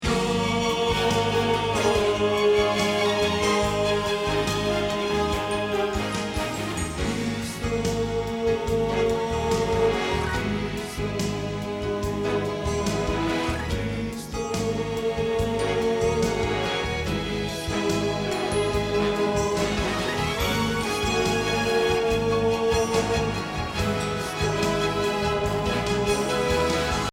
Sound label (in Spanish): Bajo